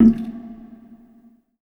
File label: PERC, Hip Hop R n B; USB Soundscan vol.19; AKAI; HHFX 89